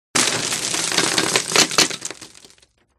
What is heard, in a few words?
crashes wood; Audio Mangler